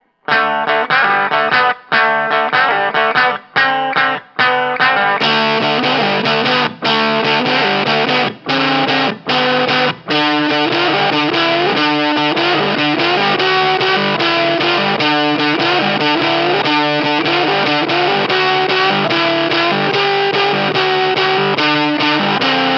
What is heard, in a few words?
high gain high range; Balls Deluxe